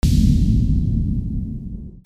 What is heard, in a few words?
WEAPONS